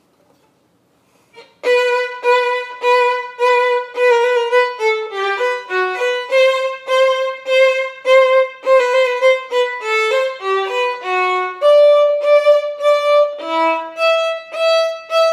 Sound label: The Parade Violin